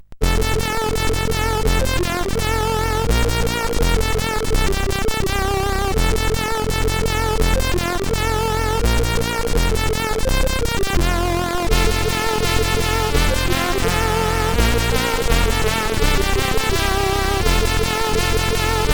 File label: Jingle Bells sid CSG8580R5